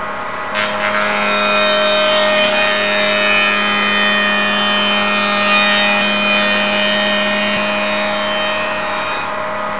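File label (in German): Fräse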